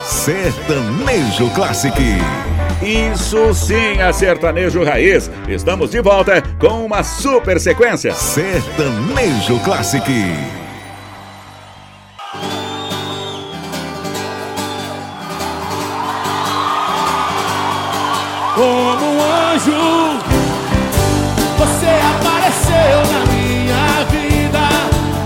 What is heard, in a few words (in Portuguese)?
Sertanejo Classic